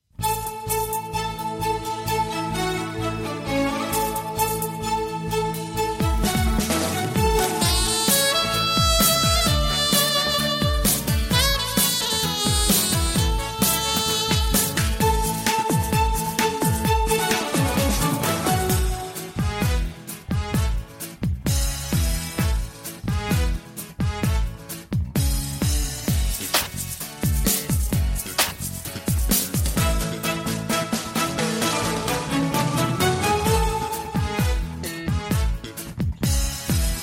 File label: X'an Devotional Karaoke